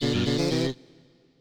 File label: Collect Empty Honeycomb; Nintendo 64; Banjo-Tooie